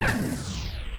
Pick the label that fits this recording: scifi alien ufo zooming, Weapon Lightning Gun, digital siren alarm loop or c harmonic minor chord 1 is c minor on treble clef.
Weapon Lightning Gun